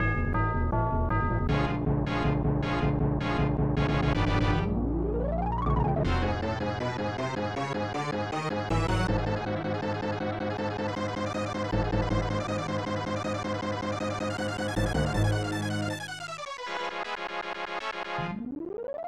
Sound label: Mission Complete; War 3010; SNES